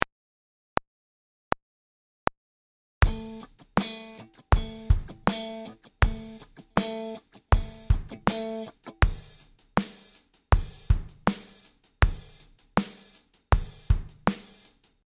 groove

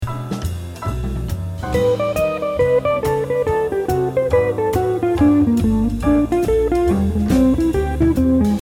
Lick

